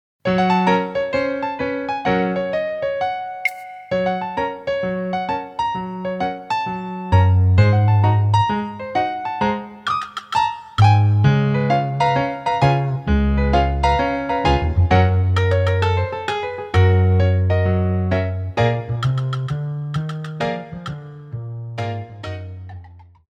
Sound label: Tendu jete, Ballet